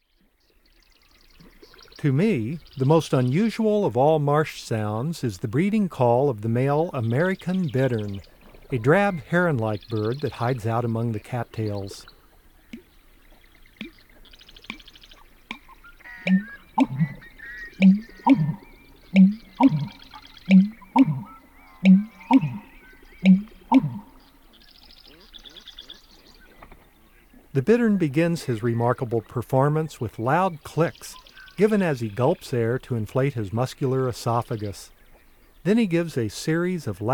American Bittern, Bird Sounds; North America; Common Western Species; Know Your Bird Sounds

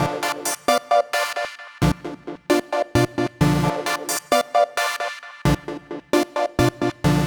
Lead Synth

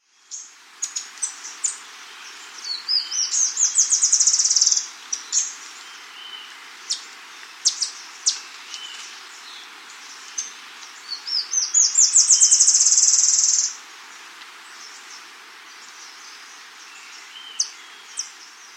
Rufous-Rumped Antwren, S. America

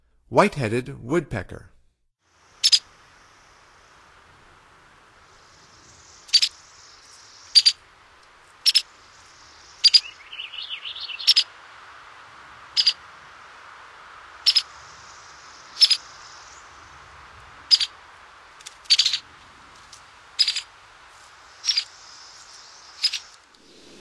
White-headed Woodpecker; North America; Stokes

